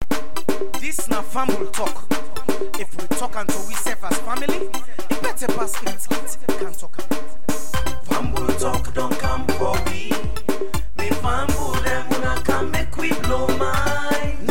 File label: jingle